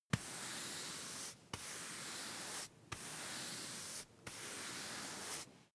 broom hand sweep slow sequence

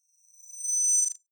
sci-fi beep fade in high

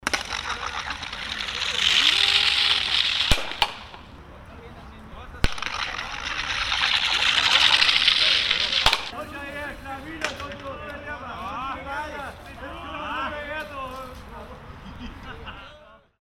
curling match atmo

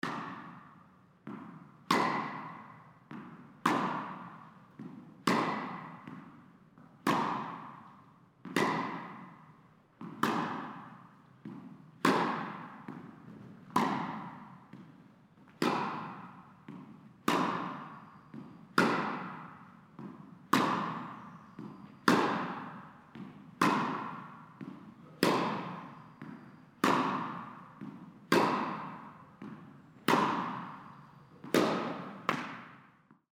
indoor tennis ground stroke

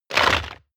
crab movement cracking

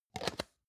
plastic can small lid close medium

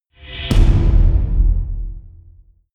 big drums short

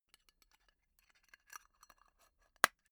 tobacco tin close unsteady long

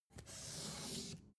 take book soft short

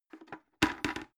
bucket handle use bounce drop

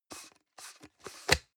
plastic can small lid screw open medium

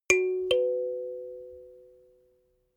notification kalimba fourth up

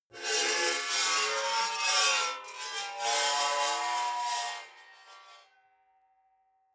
metal scrape rich sequence long

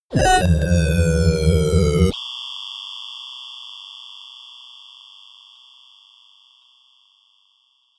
synth drop

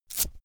velcro open cap fast

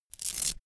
velcro open cap quick

